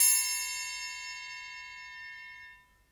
Triangle, Orchestral Percussion One Shots; Sonic Foundry (Sony Creative Software); Orchestral VOL-2

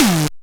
Crushed Perc, bitcrushed; musicradar

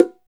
ETH BGO TONE, BONGOS; Drums In Your FACE!

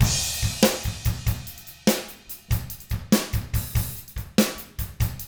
rock 6/8, drums; ILIO; Double Platinum Drums 1

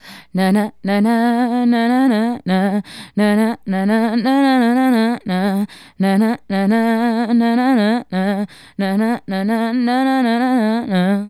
Female Solo, Hip-Hop and RnB Vocals

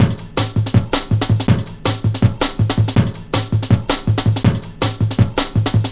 162, breaks, Loops; M8

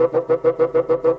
Bassoons, Orchestral; Sonic Foundry (Sony Creative Software); Orchestral VOL-2; Modern 22

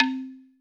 BALAFON C3, Africa

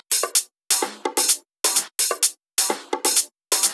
Loops 128BPM, Drumloops

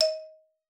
D#4, xylophone; Xsample VOL-4

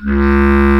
BASS CLAR C#1; WIND

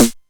Snare, Novation Drumstation 909

